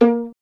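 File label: STR violin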